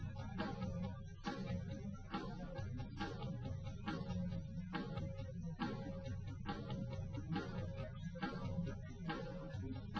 wdrums liverec; SiSEC08; SiSEC underdetermined

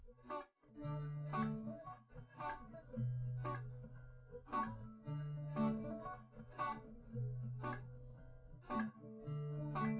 no drums live rec 250ms 1m; SiSEC11; underdetermined

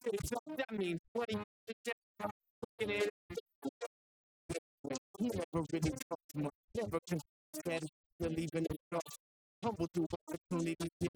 vocals; SiSEC11

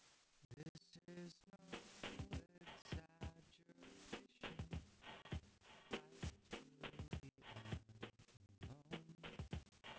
wdrums inst src; SiSEC11; underdetermined

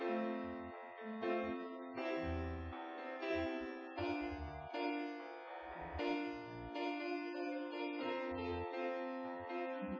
nodrums inst sim

